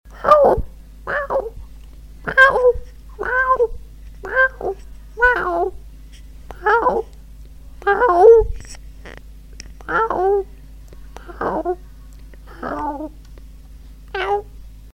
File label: mew